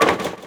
Bowling

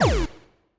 Menu SFX Lose Life

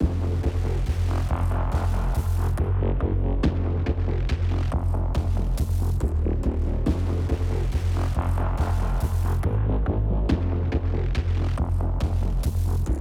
Bed 140; Cairo

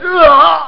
Scream; NPC Scream

